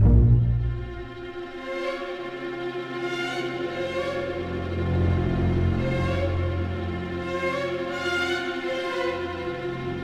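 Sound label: Suspense loop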